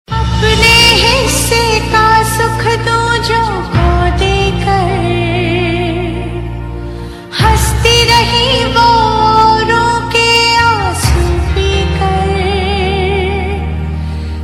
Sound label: Sad Female; Apne Hisse Ka Sukh Dujo Ko Dekar